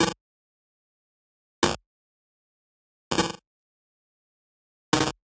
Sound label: Arcade Click Negative; boom library; The Interface